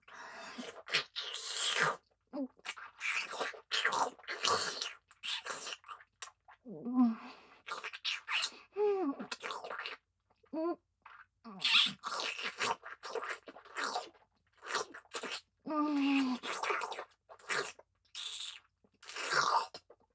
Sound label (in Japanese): 咥えフェラ（普通）